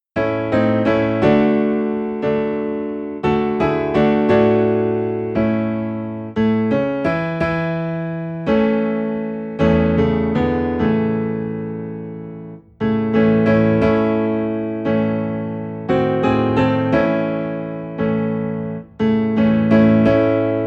Piano Low in A

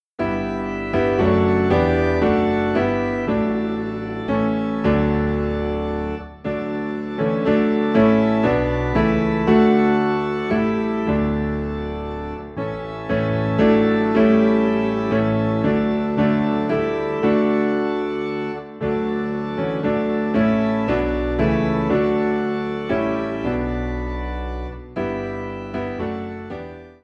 Piano and Organ Low in D; Grace Greater Than Our Sin

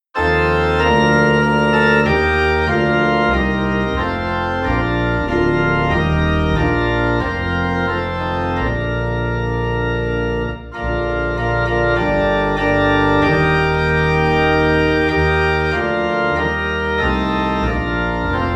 Organ Low in B Flat; Crown Him With Many Crowns

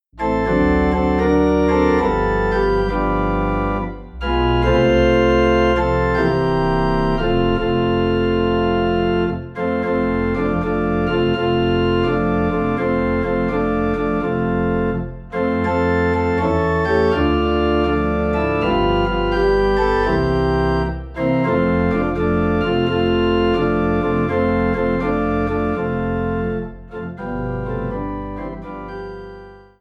Organ Low in F

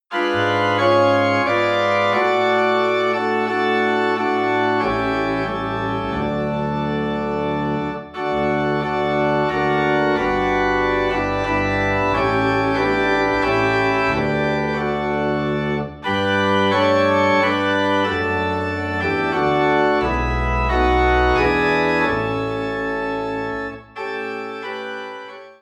Organ in D

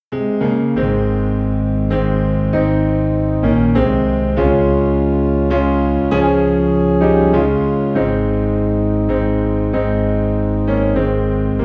Piano and Organ Low in A Flat; Just As I Am

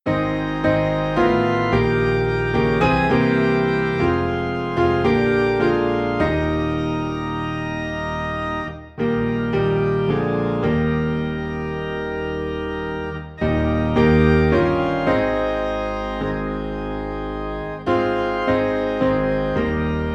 Piano and Organ Low in E Flat

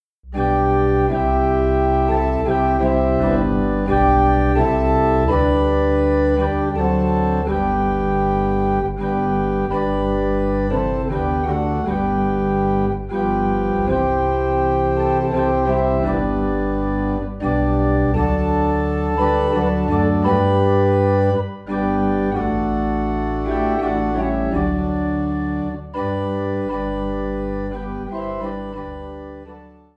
Organ in G

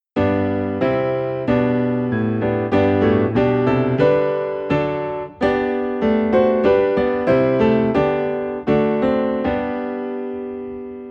Piano in G; Guide Me O Though Great Jehovah

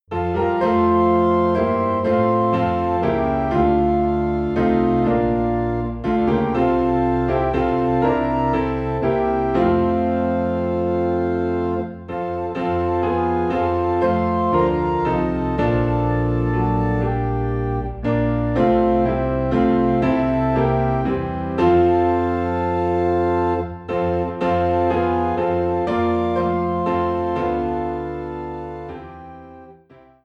Piano and Organ in F; Turn Your Eyes Upoin Jesus

